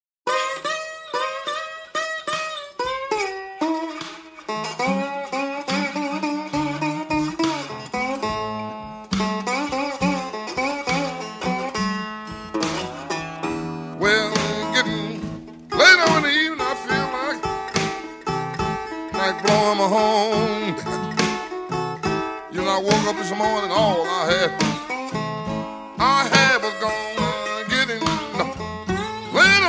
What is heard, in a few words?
Slide Guitar